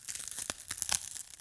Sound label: ice cracking